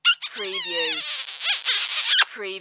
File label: Long Squeal Kiss; Sounds of kisses and kissing sound effects.